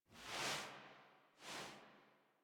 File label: Apartment Foley; Warframe; Zariman; HubApartment